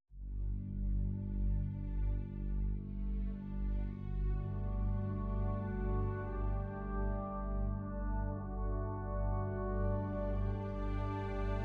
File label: Brass